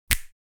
Snapping fingers single snap

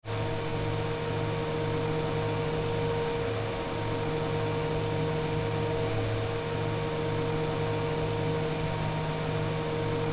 Electric fan blade spinning slow speed; appliances

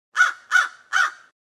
Crow croaking

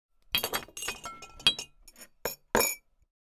Kitchen utensils rummage clatter drawer

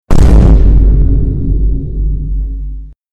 Meme funny bass hit; bass boosted

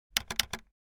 Bluetooth keyboard keystroke pressing multiple

